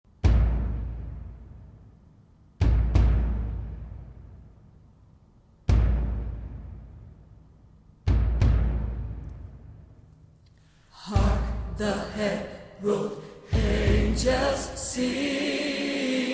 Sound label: Hark The Herald Angels Sing Alto; Christmas 2020